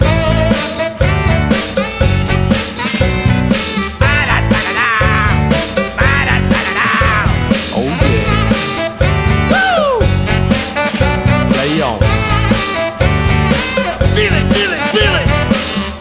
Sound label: Cowbell; Happy Feet Two; NDS